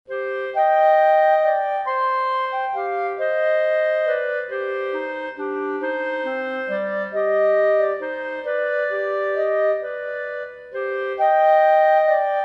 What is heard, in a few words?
Siciliano duo 2 clarinets; chamber music